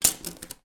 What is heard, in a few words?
office ruler plastic broken two halfs down on table